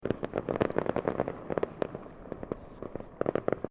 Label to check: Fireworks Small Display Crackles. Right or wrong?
Right